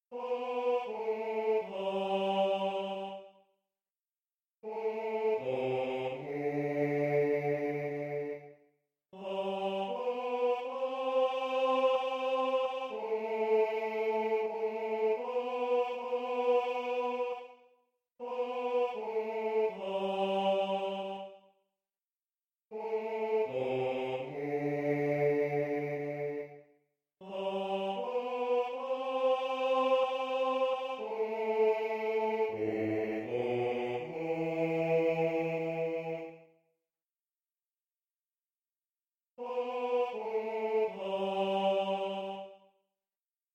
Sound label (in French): basse